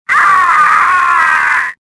death; Star Wars Jedi Knight II Jedi Outcast; XBOX

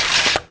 sword out; Pirates Of The Caribbean; DUEL

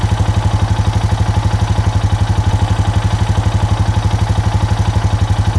ATV Engine Loop; Vehicles

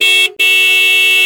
klaxon; XBOX; Furious Karting; Decors; Scenery

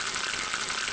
man pee; Soldier Of Fortune II Double Helix; XBOX

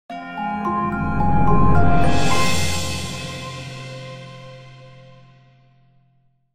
puzzle solve; The Da Vinci Code; XBOX

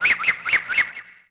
amb bird; Tony Hawk's Project 8; XBOX

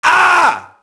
merc death; enemy; Max Payne

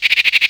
Bird; Project Gotham Racing 2

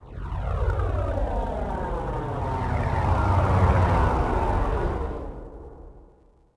traffic By; Star Wars Obi Wan; XBOX

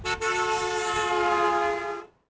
Amb Doppler; Cars; XBOX; Ambient

